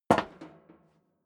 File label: step sneaker metal rattle single left; schritte